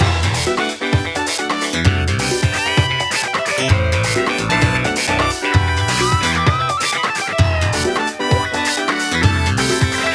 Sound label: jungle LOOP; Deathwish Enforcers